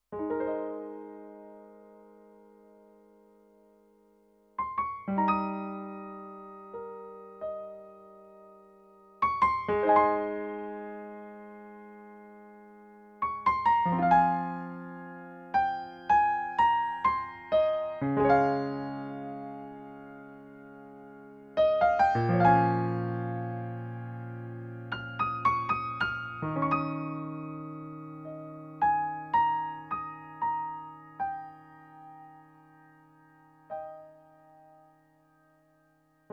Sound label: Piano